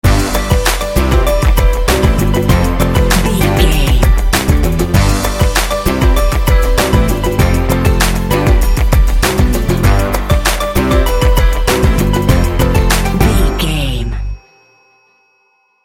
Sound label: cutie baby hip hop jingle sting